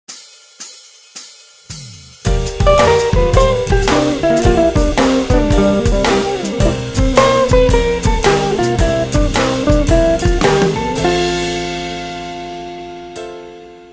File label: jazz